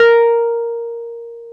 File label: PNO, Roland Keys of the 60s and 70s, Wurly; Roland LCDP10 Keys of the 60s and 70s 2; Wurly 200